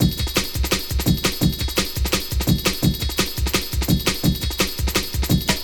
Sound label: Break, Drum & Bass, Beats & Breaks; Sonic Foundry (Sony Creative Software) - Fluid Dynamics Drum & Bass